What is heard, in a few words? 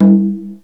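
slide l conga, Roland R-8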